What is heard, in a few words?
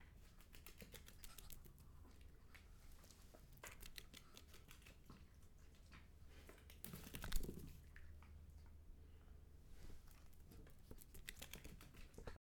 Dog Running